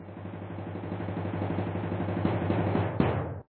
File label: Give order Drumroll; papas cupcakeria online windows gamerip 2013